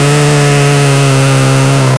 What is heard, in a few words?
900cc motorbike full spee; motorbikes